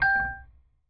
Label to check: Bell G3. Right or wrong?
Right